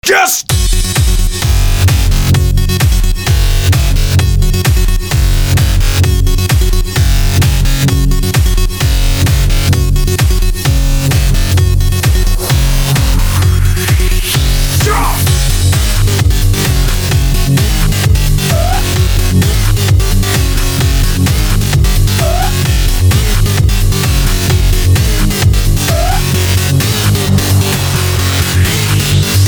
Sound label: Electro